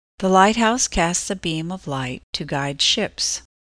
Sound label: beam